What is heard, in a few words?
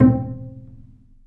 Bass Piz G C3; Digital Sound Factory Virtuoso